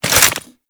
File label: Dual Minigun Reload; Warframe; Corpus